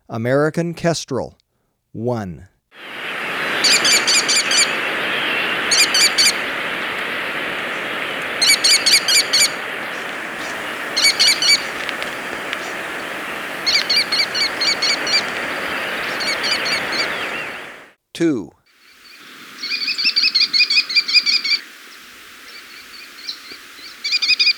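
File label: American Kestrel; Birds of Ecuador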